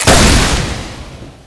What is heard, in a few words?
direct hit shoot; chicken fortress 2